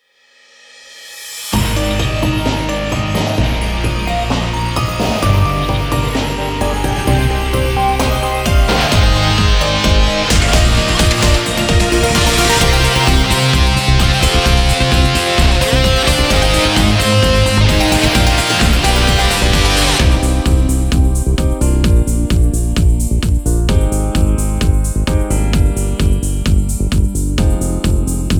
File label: Karaoke; ryu uta ryu ga gotoku karaoke best selection